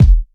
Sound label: tight low end kick drum sample e key; kick drum samples